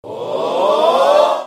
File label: crowd oh